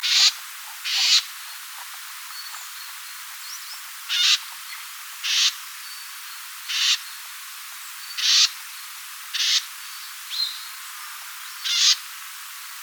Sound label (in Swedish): krupers notvacka